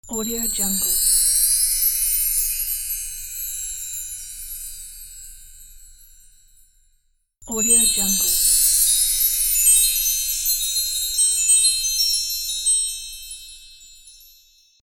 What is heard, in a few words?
christmas chimes